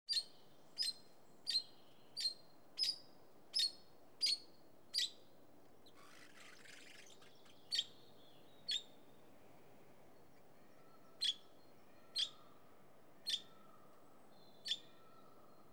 Red Rumped Parakeet; Animals and Birds; BBC Sound Effects Library

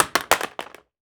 Drop Remote Control On Tiles; Dropping Things; The Sound Pack Tree

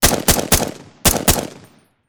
Assault Rifle - M4 - Burst; Fascinated Sound; The Gun Locker SFX Pack

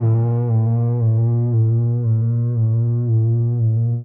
Feed chorused, Feedback FX; 99Sounds; Dark Engine

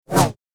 Double Bladed Saber Sound; FXHome

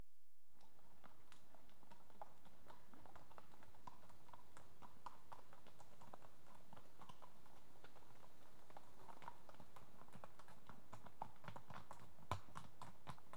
Two horses walk past on trough tr; BBC Sound Effects Library

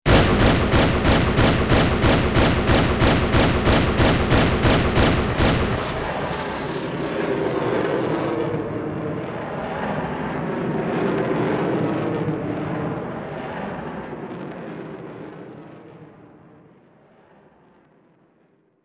Bullet Time; FXHome; Bullet and Gun

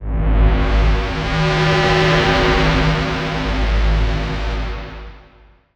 mothership texture; BlueZone; District 10 Mothership Sound Effects

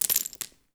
debris ice; ICE BLOCK; 3maze

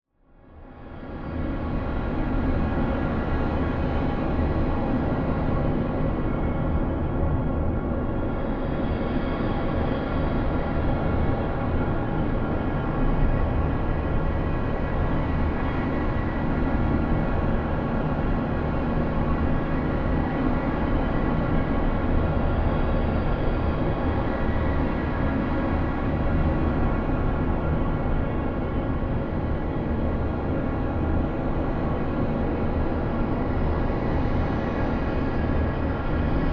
SPACESHIP INTERIORS LOW; Filmnoise Inc

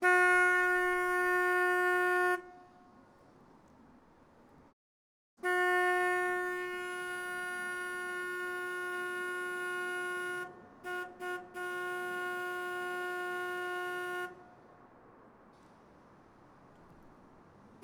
narrowboat horn various; Gardner 4LW 1960; Pole Position

